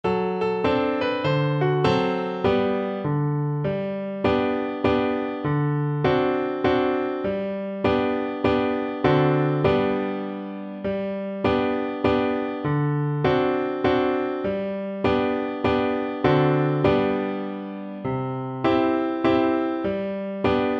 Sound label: cello